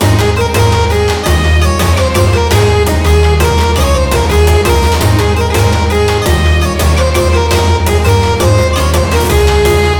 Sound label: Festal Dance